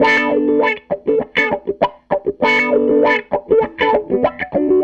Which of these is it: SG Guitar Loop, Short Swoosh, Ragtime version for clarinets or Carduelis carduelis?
SG Guitar Loop